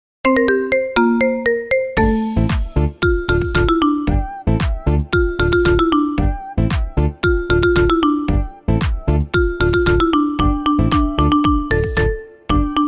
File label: Marimba